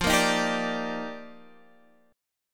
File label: F 13th Suspended 4th; chord